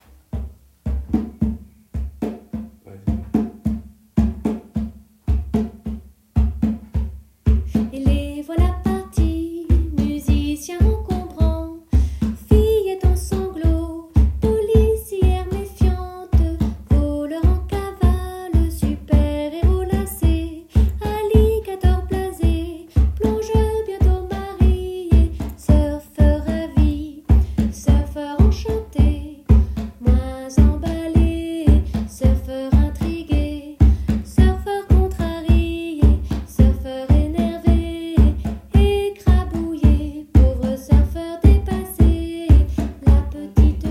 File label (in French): percu chant; En voiture; musique en voiture